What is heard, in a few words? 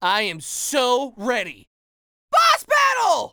boss battle; BATTD; finn